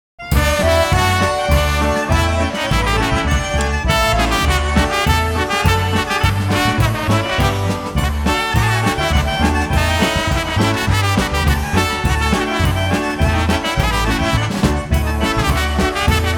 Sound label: Polka